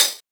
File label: Tamborine, 707; classic machines; 707mod